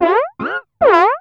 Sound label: FLANGE FX, Korg; Back In Time Records; Korg Universe VOL-1; FX FAMILY